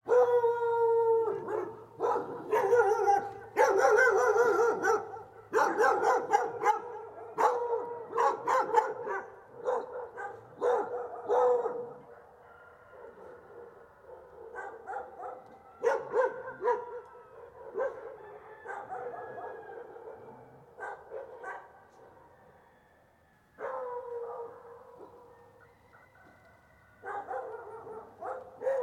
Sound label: animals dogs bark close up others reply distance reverberant; felix blume